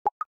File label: minute minder